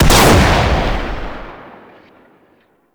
Pump Shotgun Shot B; Weapons; Warframe; Zariman

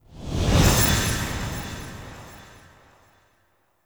Camera Spot Stinger; Xeto; Warframe; Jade Shadows

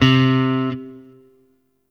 Shawzin Oct Three C; Warframe; Sentient Shawzin

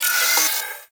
Turret Harness Movement One Off

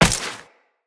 Step Ice, Footsteps Walk; Tenno

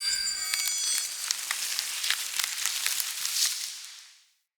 Thorn Blade Attach; Powers; Fey

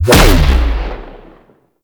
Blastor Pistol Explosion

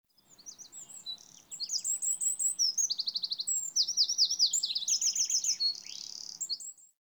Bird Chaffinch; Warframe; Ambience; Nineteen Ninety Nine

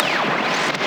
Static Out; Night Wave

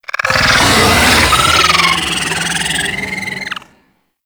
Pet Vocal Death; Warframe; Alad V Pet

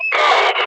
Enemy Pain; Warframe; Lasrian; Battle Barks

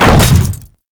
Hammer Boss Land Default, Footsteps; Warframe; Grineer Hammer Boss

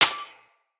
Melee Sword Impact Generic

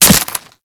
Kubrow Melee Impact Flesh

